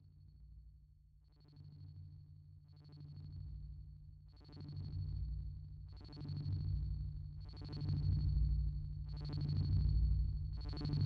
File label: sinewaves